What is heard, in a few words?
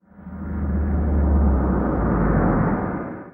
Large Spaceship Pass